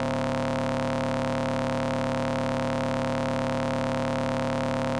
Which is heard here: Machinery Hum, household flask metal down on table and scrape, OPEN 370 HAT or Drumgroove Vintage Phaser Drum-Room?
Machinery Hum